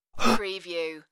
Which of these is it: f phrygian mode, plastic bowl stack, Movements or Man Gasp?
Man Gasp